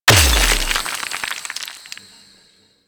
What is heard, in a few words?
Mining Laser Rock Breach; Weapons; Warframe; Eidolon Mining Lasers